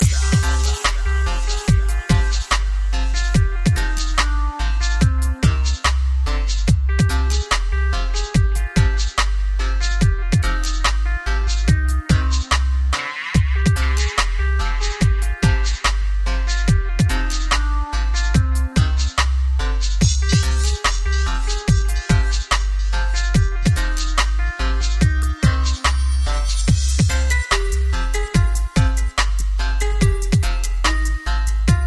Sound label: DUBSTEP